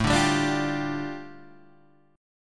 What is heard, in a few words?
A 7th Suspended 4th Sharp 5th A, Guitar Open D; 7,5,5,8,8,5